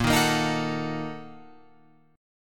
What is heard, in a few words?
Bb-Major 7th Suspended 4th Sharp 5th, Guitar, Open F; strummed; JGuitar